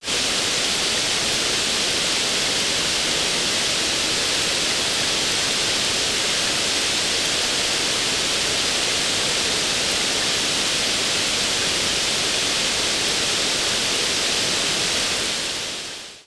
forte Pluie

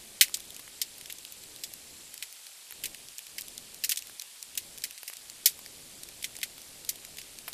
feu mix

